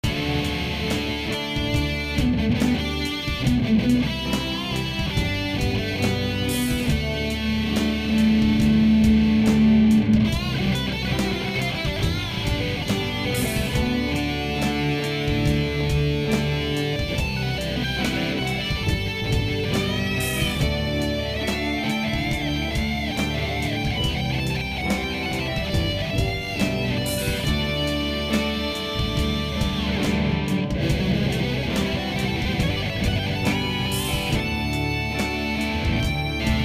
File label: in the night end solo, guitar